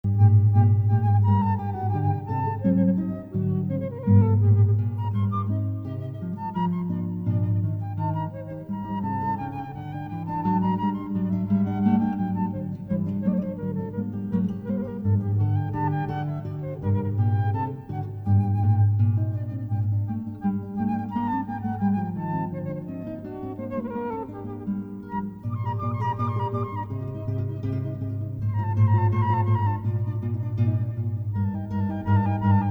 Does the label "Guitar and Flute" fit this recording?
Yes